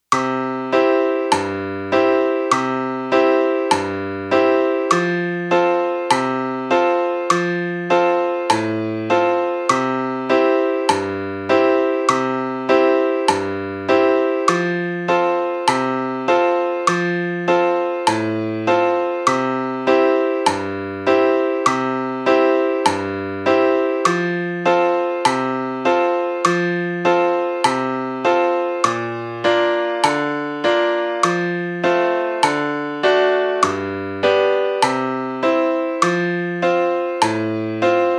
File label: accomp.